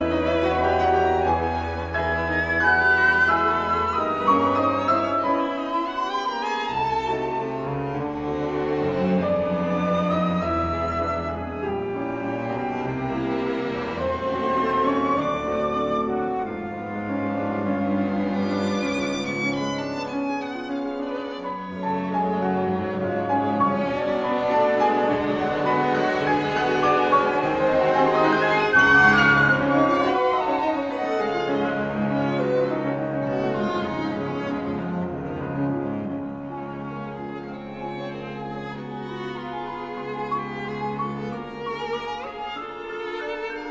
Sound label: septuor fantaisie ou concerto op 72 for piano two flutes two violins viola